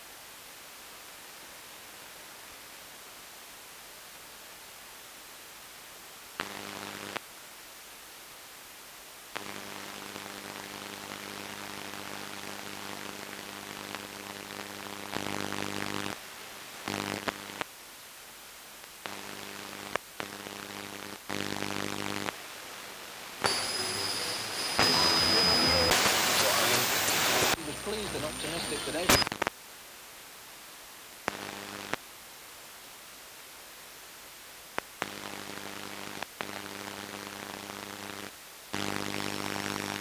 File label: Radio